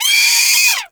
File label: Sea Bird Vocal Death; Characters; Warframe; Ostron; Hunting Critters